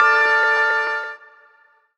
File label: PIA MOOD FX; Rawcutz; Nu Forms